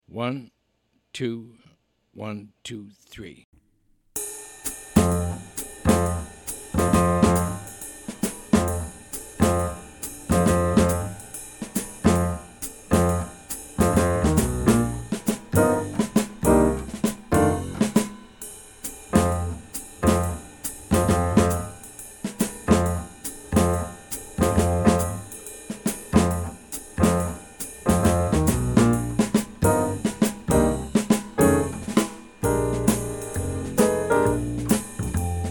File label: minus melody